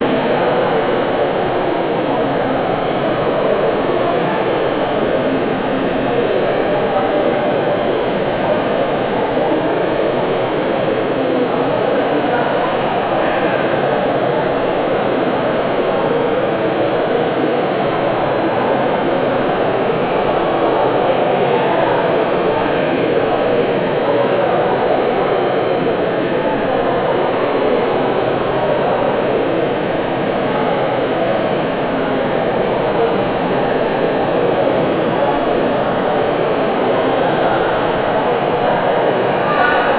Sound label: muffled sound of a large, hallway